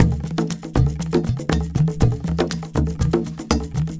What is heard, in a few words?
COMBINATION ACOUSTIC GROOVE, LIVE ACOUSTIC PERCUSSION; Sonic Foundry (Sony Creative Software); Vir Unis Infusion